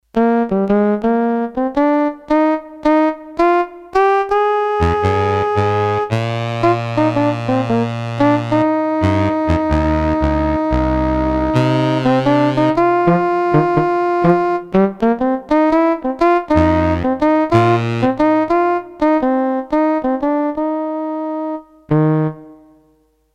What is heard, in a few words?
Sax Duo, Roland D-10; Factory Patches